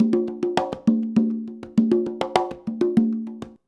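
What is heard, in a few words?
133.5 CGAF, conga; Univers Sons; Basicussions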